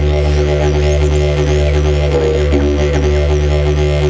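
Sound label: Didgeridoo; Breakneck; Austral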